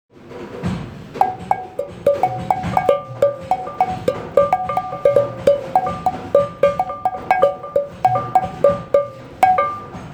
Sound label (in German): Klingklong